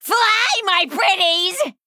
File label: Female Goblin Attack